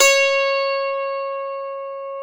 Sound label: lion harp C#2; SoundBytes; Early Patches